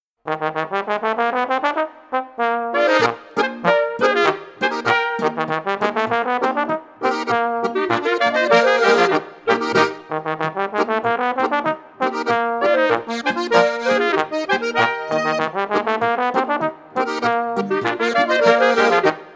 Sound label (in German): Boarischer